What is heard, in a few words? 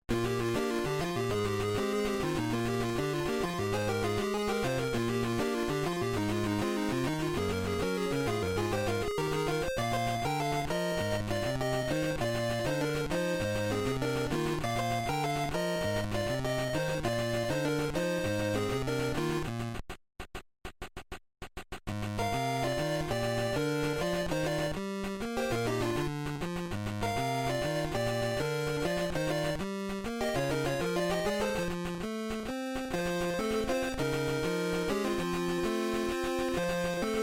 Level Theme; Mouse Trap Hotel; gb